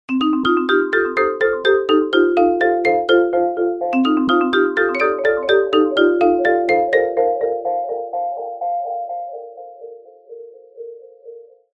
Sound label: marimba delay sound ringtone